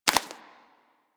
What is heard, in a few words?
foley idle ear flap; Enemies; Warframe; Catbrow